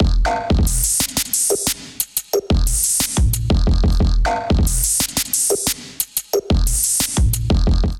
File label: Robot Parts Kit, glitch and wash drums; Ableton; Factory Packs